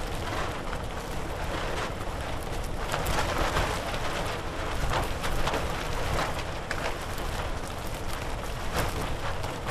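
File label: tarp movement plastic close; Titanfall 2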